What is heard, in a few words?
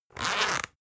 zipper open; objekte; reissverschluss